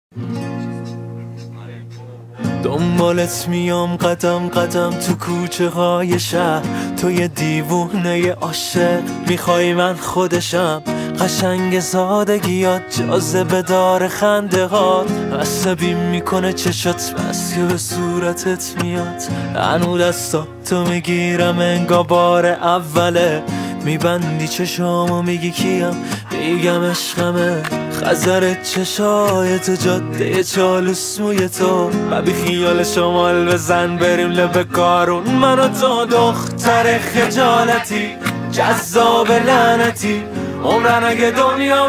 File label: Gitar